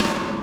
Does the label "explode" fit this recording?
No